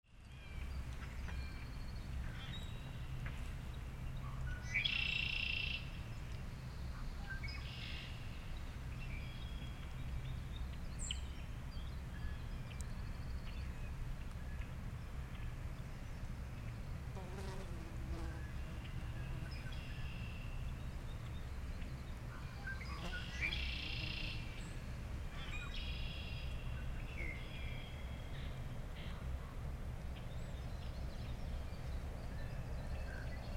Marsh Sounds, Wisconsin; Wisconsin 2019

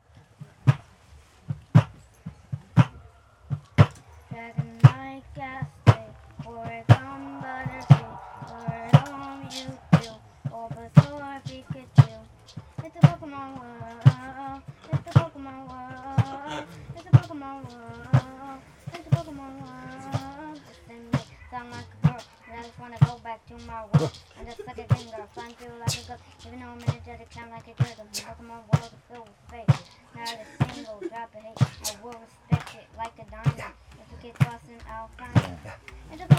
Song with a Bucket

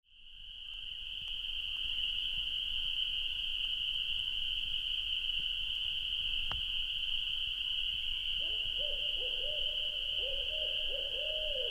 Owl Night Lake; Wisconsin 2019

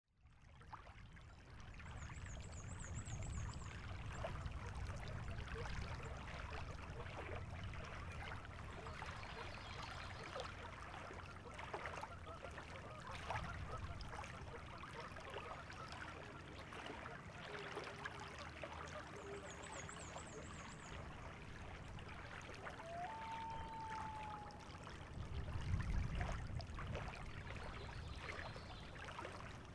Lake Waves; Wisconsin 2019

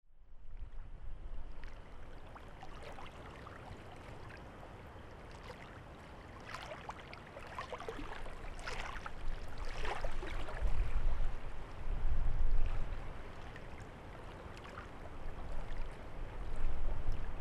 Lake Waves; Wisconsin 2019

